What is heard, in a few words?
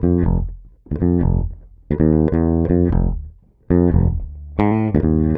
088 Dance Pop Bass F, E-Bass; Pop Rock; Pro Tools Essential Loops; Digidesign